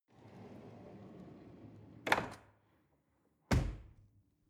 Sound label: drawer cutlery close draw